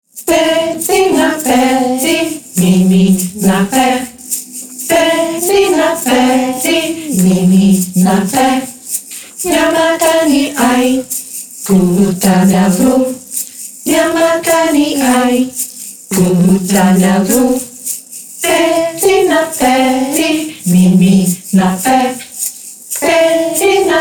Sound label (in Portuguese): Cancao de Ninar